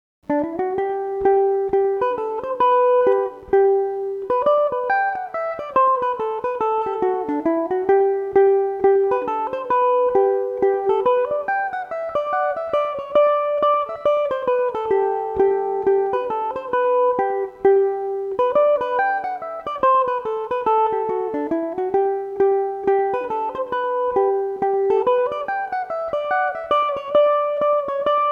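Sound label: hornpipe G major, irish mandolin; mister walkers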